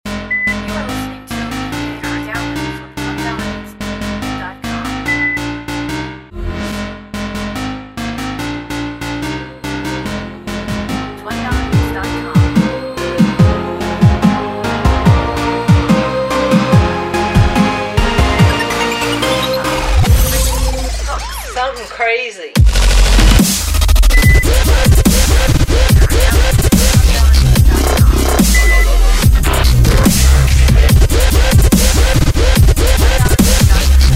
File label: DubStep